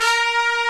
trumpet A#3; Greytsounds VOL-1

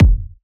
kick drive

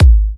Kicks G#; markus hakala

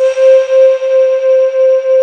BLOTTLE C4

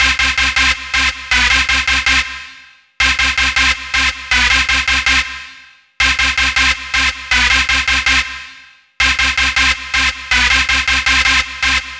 Dance Synths, Hardcore Loops, Synth Loops

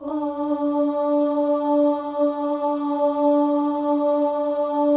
OH, M+F; Spectrasonics; Symphony of Voices; M+F OHS

